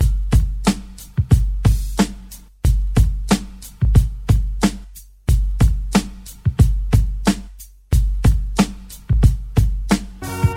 91 bpm 00s rap drum loop g key, Free breakbeat sample - kick tuned to the G note